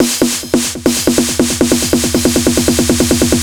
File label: Fill 140 BPM; Vengeance Packs; vengeance essential club sounds-3; VEC3 Loops 140 BPM; VEC3 Fills 140 BPM